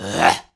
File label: growl male